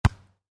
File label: Volleyball (Exterior): Hit Medium; Sports; Miscellaneous Sports